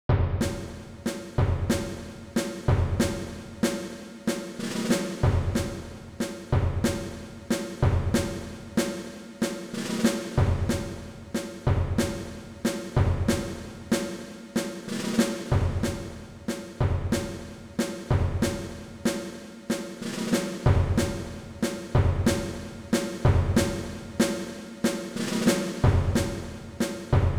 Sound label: Old drums; Tracks Toybox Edition